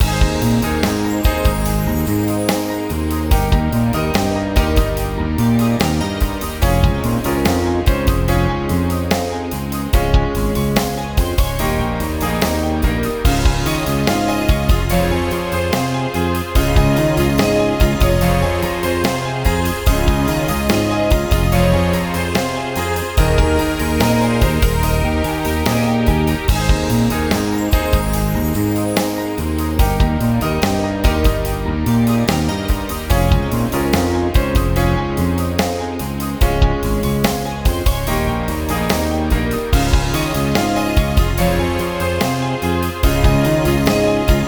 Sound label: Victory Loop